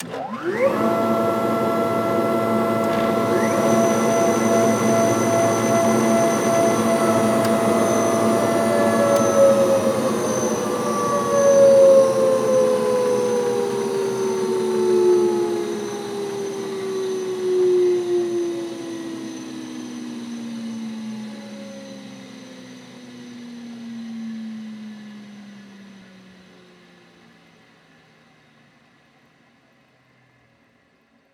machine floor sander turn on run turn off

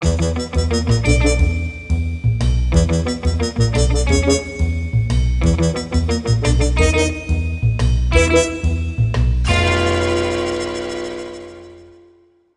Bari Sax; simpsons hit run; ps2

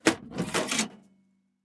SFX case Remove Metal; PC Building Simulator

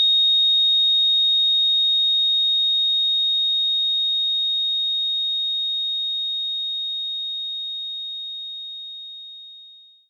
Cold Glass Wave; knights try

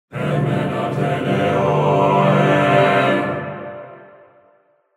Sting; In Death VR; Voices Of The Ancestors

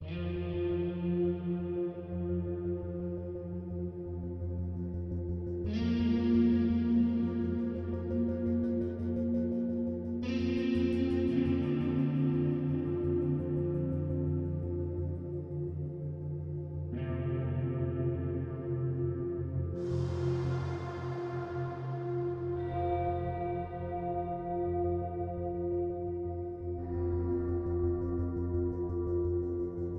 Sirens; Tails the Backbone Preludes

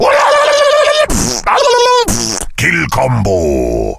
Kill combo

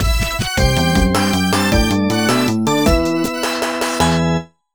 World Complete (Galactic Jungle [Space Level])

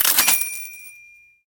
UI Menu Purchase

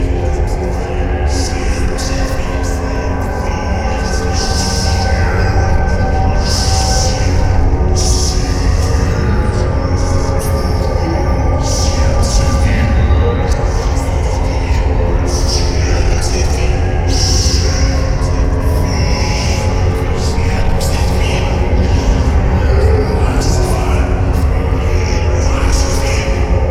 Chants; SniperUndead EnvStream

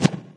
Switch Sound; Candy Crush Saga

